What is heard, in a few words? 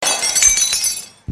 Glass Break Sms